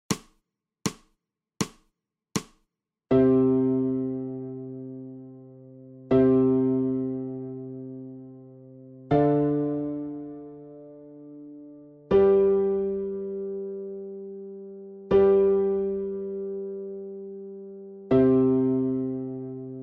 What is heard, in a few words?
ALTO